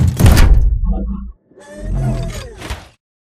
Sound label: embark casual above right; Titanfall 2